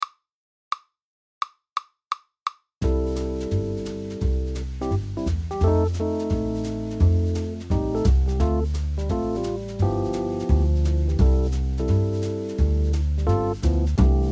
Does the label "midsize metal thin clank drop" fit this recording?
No